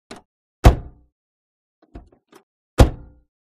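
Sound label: Door Open Close Cadillac Door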